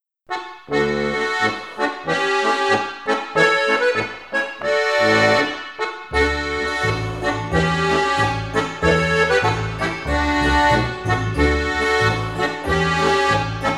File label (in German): Steirisches Hiatamadl; Volkstanz in Kärnten